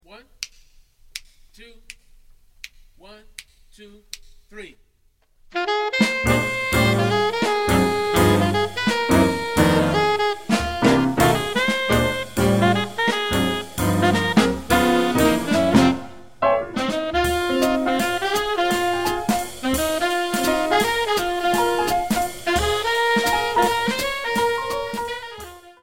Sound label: minus Bass